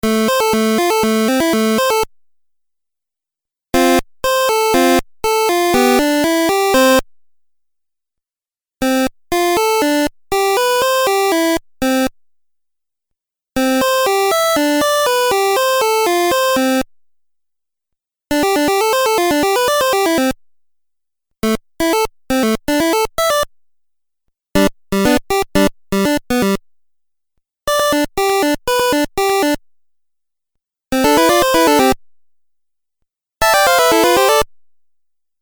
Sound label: Musical Phrase Video Game; Musical Sound Effects